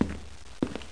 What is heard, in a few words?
Step